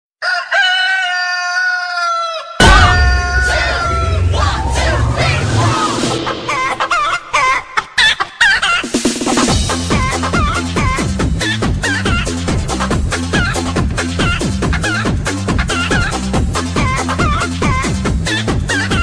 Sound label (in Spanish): Gallo Despertador